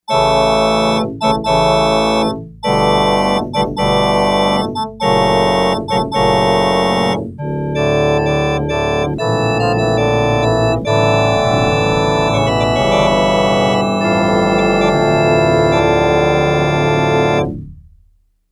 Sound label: Full Ranks, Yamaha DX100; Factory Patches